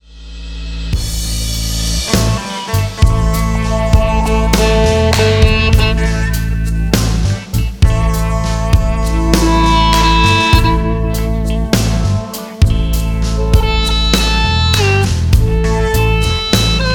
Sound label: harp